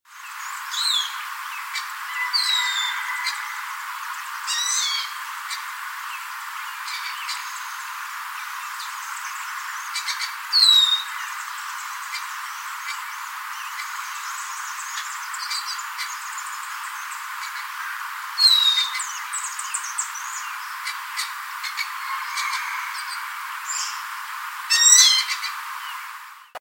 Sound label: slur calls; FAMILY EMBERIZIDAE; A sweet, whining chi-ee (F). / A clear sweet call (L).